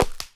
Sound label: arrow wood, attack impact